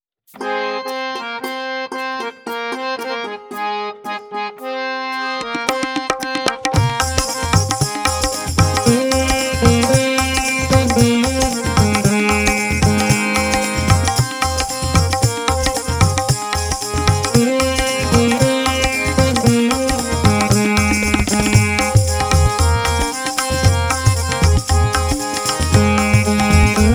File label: Gents Karaoke G Sharp Pancham